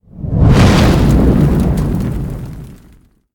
swing fire

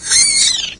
tick death; doom3

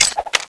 clip out; tfa cso

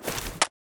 ak47 draw; weapons

